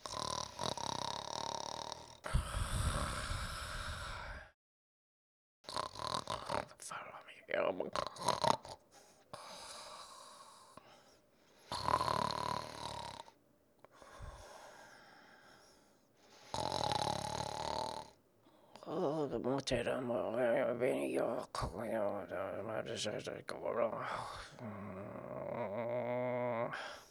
sleep loop

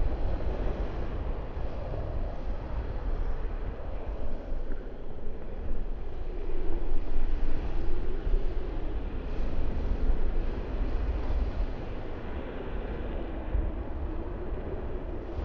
atmos interior crumbling and wind; Hollow Knight: Silksong

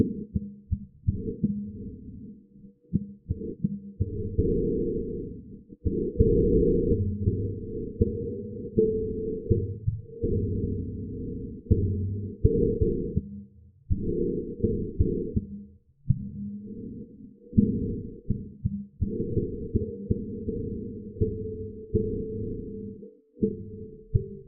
needolin general vibration

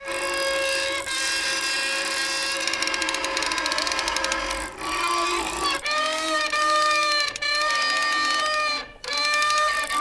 song handmaiden voice sing loop

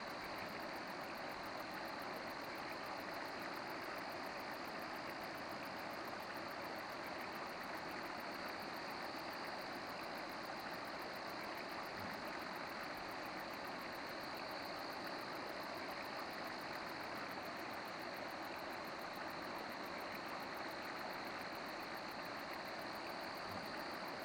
atmos moss cave; Hollow Knight: Silksong

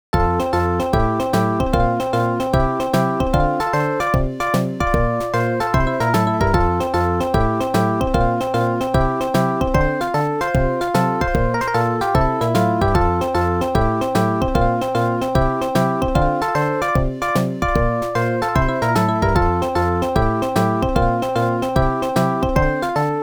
Continue; Genesis; Mega Man The Wily Wars; The 16bit Audiophile Project

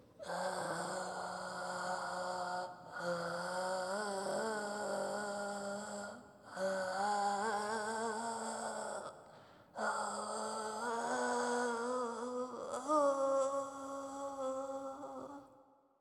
Old sing

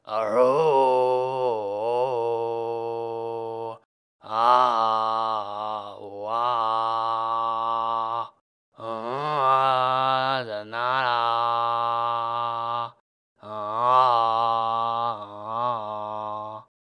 sing; Caretaker; Hollow Knight: Silksong

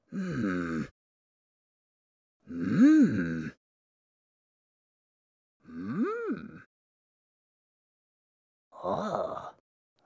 rummage loop; Hollow Knight: Silksong; Grindle

